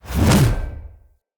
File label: Spear Whoosh; Weapons; Enemy; Spears; Nimrod Spear